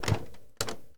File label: 91 Firebird Door Open, Automobiles